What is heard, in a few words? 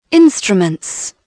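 instrument; Dorling Kindersley My First Incredible Amazing Dictionary; ORCS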